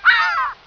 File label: TWO GULL